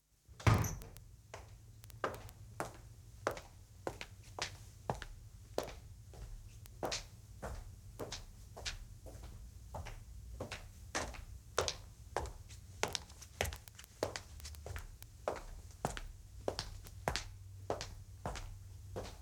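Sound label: sounds, footsteps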